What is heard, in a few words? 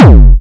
bombo, kicks, Hardcore